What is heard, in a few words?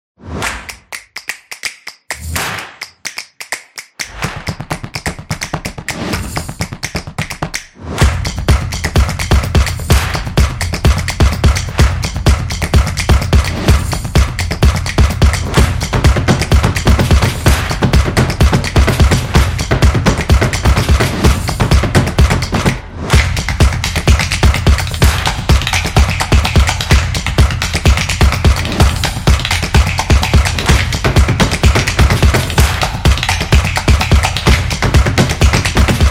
The Drums Upbeat